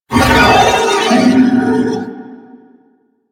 Horse Vocal Screech Jump; Warframe; Vehicles; Duviri

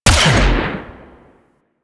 Shape Blast Shotgun Fast Fire; Warframe; Weapons; Corpus

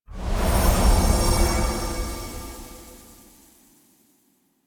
Amphitheatre Show Transition; Warframe; Duviri

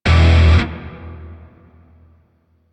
Shawzin Maj Chord C; Grineer; DE; Warframe

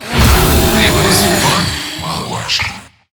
Death; Hard Drive

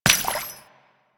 Steps Water, footsteps; Foley

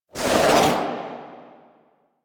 Speargun Throw Windup Foley; Warframe

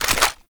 Computer Board Pickup; Warframe; Quests; Entrati Labs

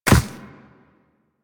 Walk Ice, Footsteps; Warframe; Operator

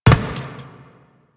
footstep metal; Enemies; Warframe; Teshin; DE

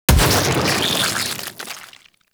Infested Burst Explosion; Warframe

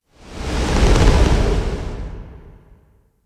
Tower Trunk Movement; Warframe; Quests; TheNewWar; Ambience; Cetus; Unum Tower

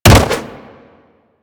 Jump Attack Default; Footsteps; Warframe; Tenno; Wall Run

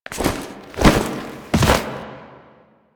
Dismount Balloon; Drifter; Warframe; The New War; Quests

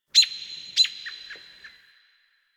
Bird Robin; Warframe; Ambience; Nineteen Ninety Nine

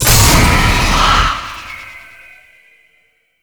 Evil Op Stinger; Entrati Labs

